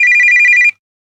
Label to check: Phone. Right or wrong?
Right